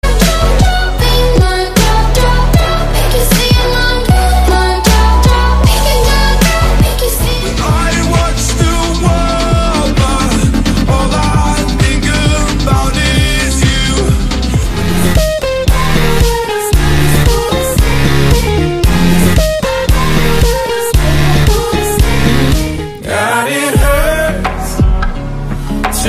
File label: hollywood music ringtone